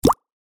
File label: notification beep sound; Xiaomi Mi-CC9